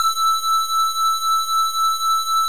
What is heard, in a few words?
square E6